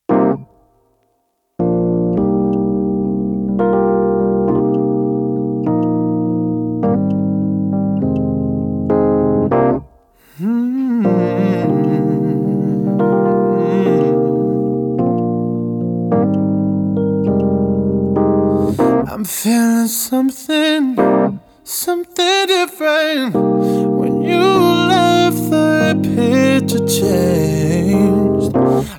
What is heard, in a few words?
acoustic